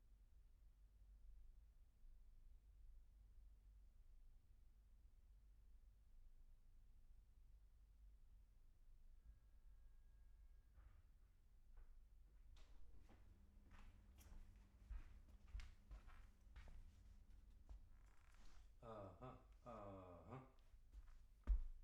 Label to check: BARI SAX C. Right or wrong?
Right